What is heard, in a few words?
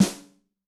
Loosy, Yamaha DTXpress-II snare